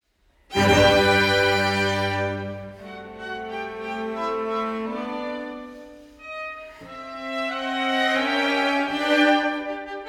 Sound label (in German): Streicher